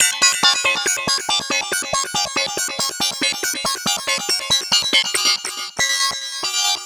Synth Arp; Trip-Hop

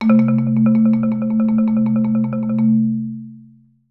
Marimba roll F2; Sampleheads - Dave Samuels Marimba & Vibes

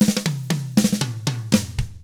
116 ROCK, Double Platinum Drums; ILIO

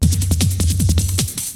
BREAKBEATS, TECHNO

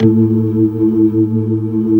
A2, Keyboards Old School; USB Soundscan vol.09; AKAI

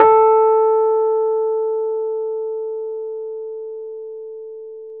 RHODES A3, Vintage Keyboards; Big Fish Audio; First Call

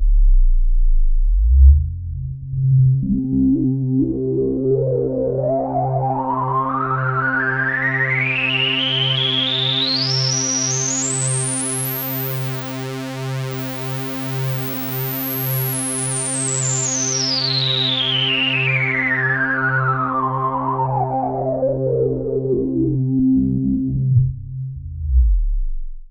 FX SWEEP

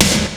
Snare, Fairlight CMI III

